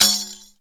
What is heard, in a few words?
req tik; Ethnic Percussion